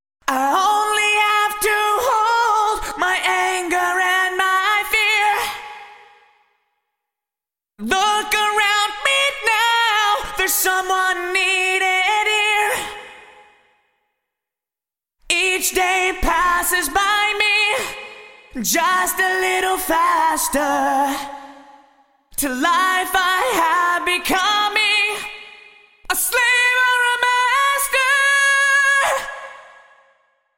Vocals Bricasti Rooms 2 Vocal Chamber Vintage Digital